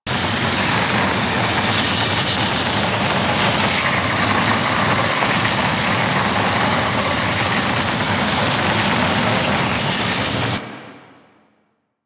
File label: Dragon Elemental Breath Poison Impact Loop; Warframe